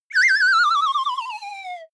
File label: whistle slide tremolo; bewegung; comedy-cartoon; soundpool